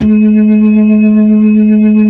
B-3 C3, Keys of the 60s and 70s; Roland LCDP10 Keys of the 60s and 70s 2; Blues

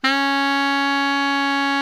baritone FF C#3, Sax; Giga Samples Collection

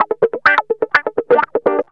GUITARS 125, House

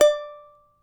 charango D4, guitars; Spectrasonics; Hans Zimmer Guitars VOL-2

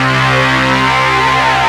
Stab

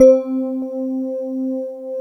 CRYSTALS

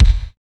kicks

